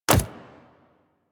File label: Step Default, Foley Footsteps, Pets; Warframe; Zanuka